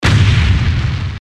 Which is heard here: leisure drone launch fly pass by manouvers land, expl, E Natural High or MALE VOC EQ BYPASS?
expl